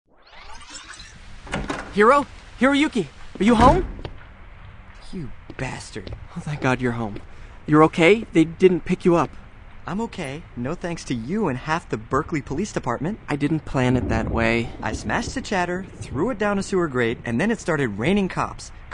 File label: raining; cops